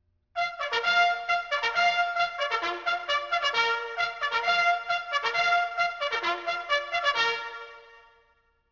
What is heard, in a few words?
adjunants call, bugle sounds